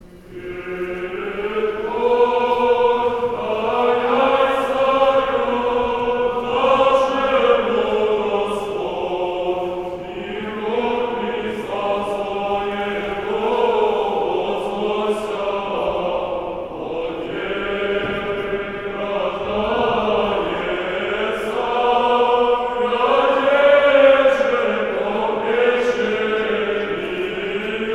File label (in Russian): Богоявление Ирмос 1 творца 3 песни; Крепость даяй